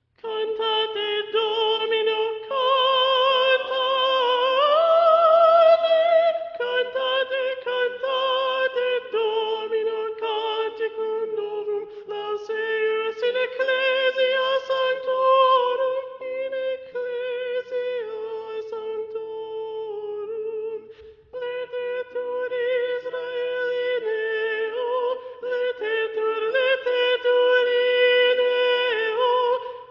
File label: Soprano; Cantate Domino